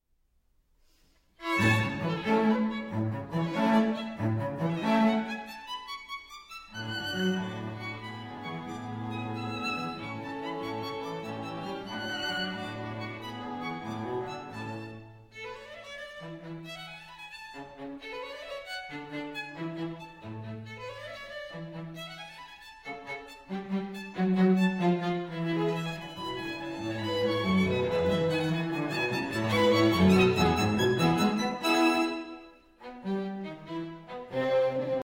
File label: String Quartet in A major